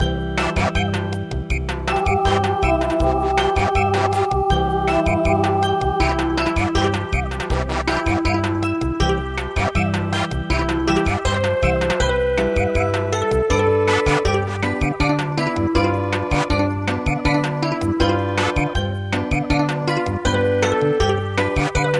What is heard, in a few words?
Wiegenlied Brahms Samba